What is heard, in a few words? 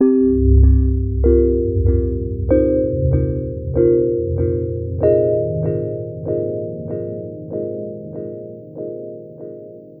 Melody, IDM